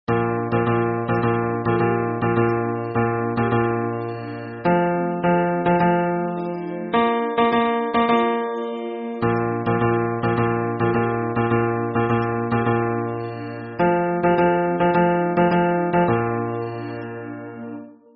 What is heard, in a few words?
hymn bass